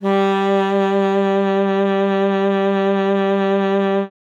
sax g3, Saxophone mezzoforte; Session Instruments

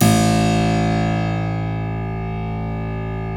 A 2 CLAV; Keyboards Acoustic; AKAI; USB Soundscan vol.17

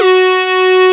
PL MOOG; Wizoo - Powered Nord; STEREO LAYER

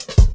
Prog Stepz Cut, Drum Loops, 166.5; Zero-G Jungle Warfare 1, 2 & 3; 90 s Sample CDs

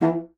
bassoon E3; C BASSOON; Xsample VOL-6

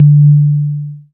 BAS FM DX-7

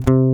Ovation C1; Mo' Phatt; Digital Sound Factory Mo' Phatt

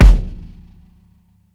KICK, drums; BASEMENT DRUMS VOL 2

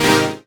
Dance Mega Synth; Best Service; 90 s Sample CDs

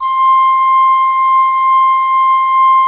HGRP C5, Cathedral Organ, HOLZGEDACKT; Propeller Island

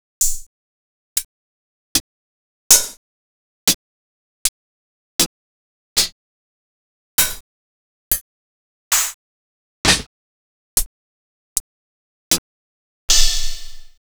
House Hats; X-Static Goldmine

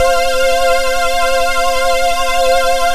SWING F3, LEAD SYNTH; Best Service Alpha Dance vol.2

